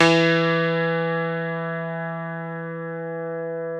PNO, Electrics, Grands

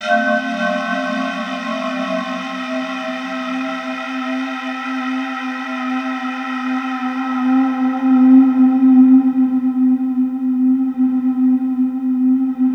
atmo, atmosph, special fx twisted; USB Soundscan; AKAI

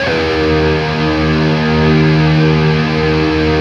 LEAD D#1; East West; Steve Stevens Guitar Samples Collection

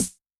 Dance, Yamaha DTXpress-II snare

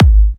G#, kicks; markus hakala kicks

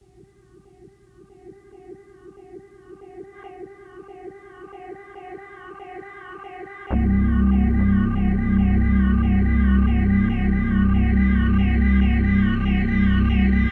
Hardcore Breakbeat, 140 Cmin; Big Fish Audio - Hardcore Breakbeat Action; Hardcore Breakbeat Action CD1; 09 Get Down

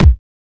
Bd Waveform, Kick Drums; Zero-G; Soundclash

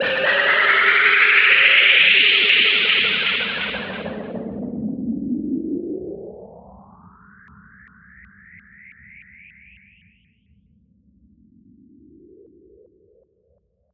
rush up; One Shot FX; Sample Magic; Transmission-X

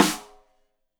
Rim Shot Stereo; Deeply Sampled Drums; SMDrum

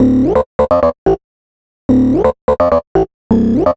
Minimal B, Underground UK House & Electro, Synths; Sonic Foundry (Sony Creative Software)

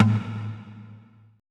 E LOG, ETH DRUMS

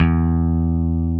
BAS; Best Service Gigapack 2

